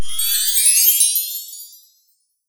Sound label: Imja Magic